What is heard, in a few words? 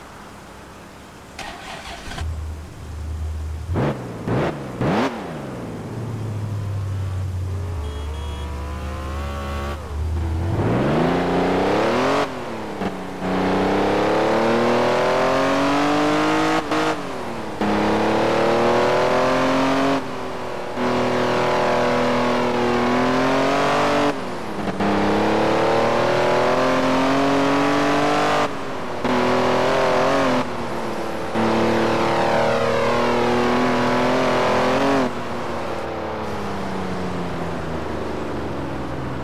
bmw m5 e28; 1988